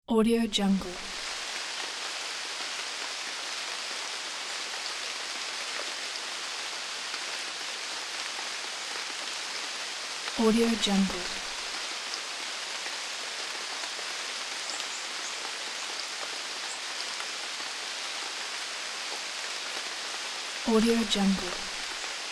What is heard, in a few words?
rain in forest; alexleadsounds